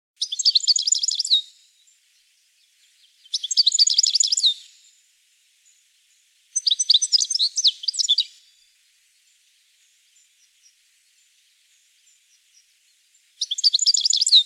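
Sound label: European goldfinch voice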